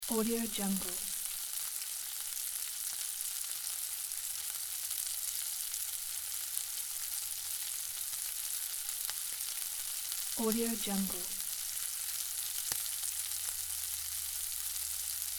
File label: real sizzling hotplate loop